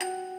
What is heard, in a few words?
musicbox